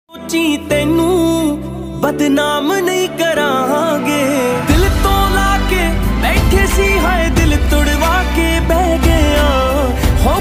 Jatt Punjabi Ringtone

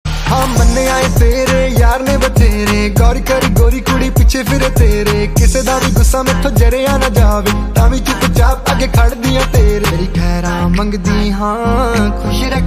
Punjabi Beats Ringtone

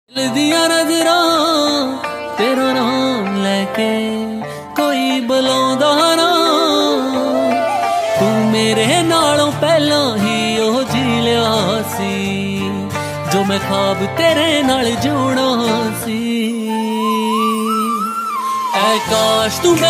Popular Punjabi Ringtone

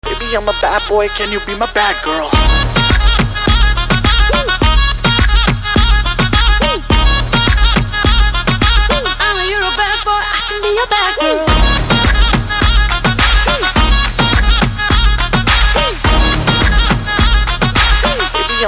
BGM Telugu Ringtone

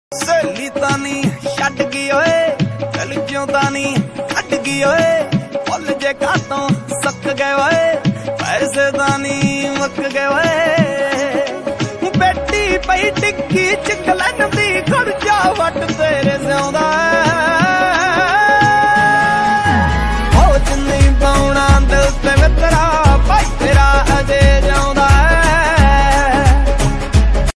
Trending Punjabi Ringtone

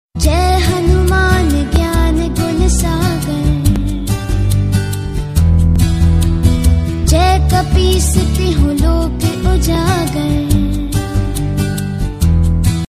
Stuti Ringtone; Child Hanuman

